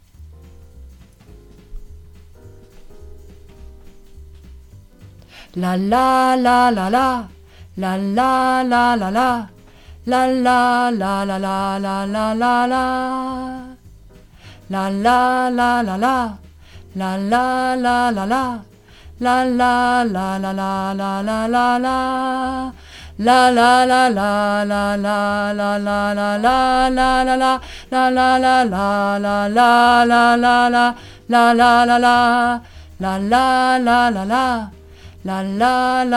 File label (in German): Bass Refrain LaLa